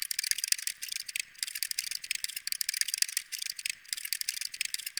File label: Puzzle Lock Idle Loop; Warframe; Duviri; Medusa Puzzle